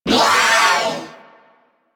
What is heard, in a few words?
Purgatory Warrior Death, Barks; Warframe